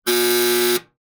sound incorrect

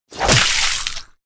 eye splat

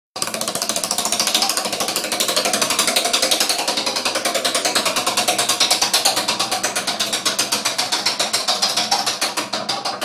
effect wheel

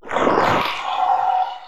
eye blink

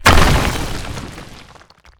wall break

